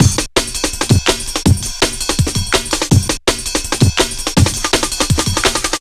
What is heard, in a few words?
165 busy ride, Drum Loops, Drum & Bass; Total Drum&Bass